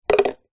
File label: foley plastic tub small down on hard ground; zapsplat